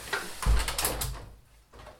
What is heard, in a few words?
closing an insulating glass window